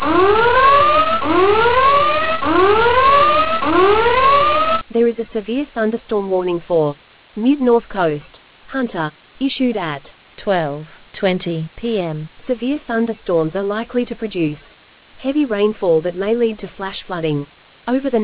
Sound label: storm audio